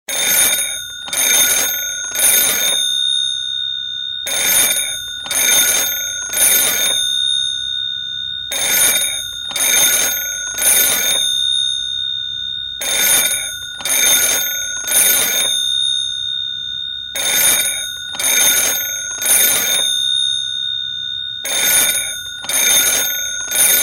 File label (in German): klingelton altes handy old phone triple